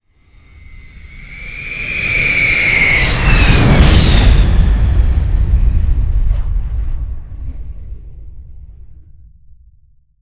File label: jet land stereo; cliff; Call of Duty Modern Warfare